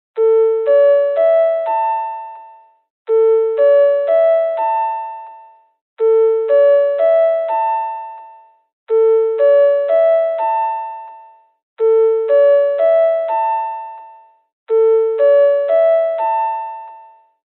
钟声; 广播室铃声系统

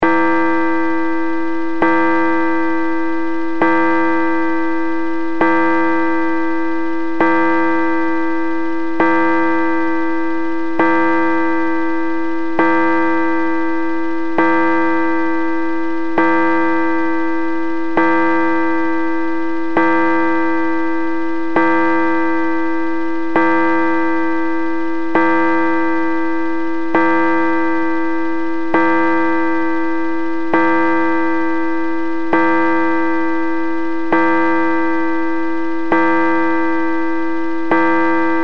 当; 钟声; 广播室铃声系统

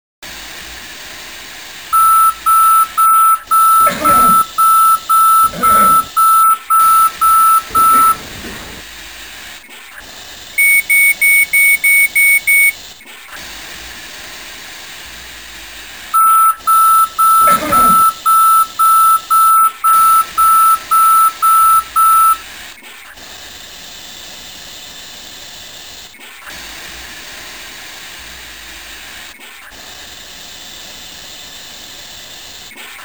警报音; 告警; 广播室铃声系统

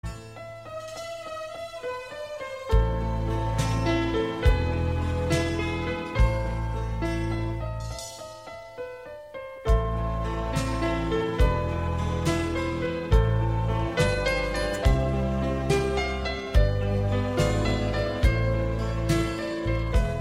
致爱丽丝; 初中预备铃声; 广播室铃声系统

